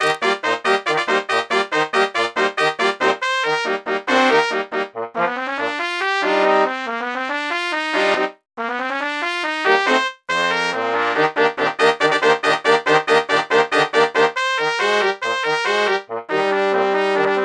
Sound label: Telephone Song Brass Quintet